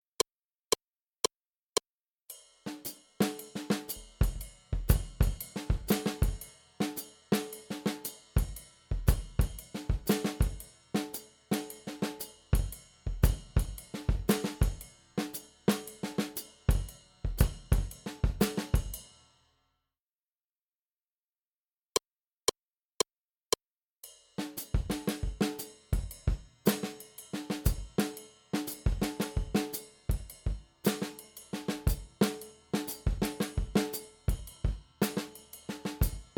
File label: 2 Bar Exercises for Jazz Drumming